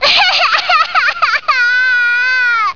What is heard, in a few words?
cry